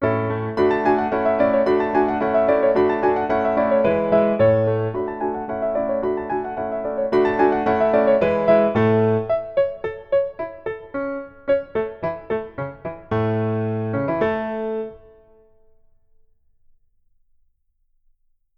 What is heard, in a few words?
violin; umass spring2020